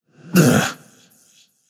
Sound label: grunt hit, voicepack; smite; vamana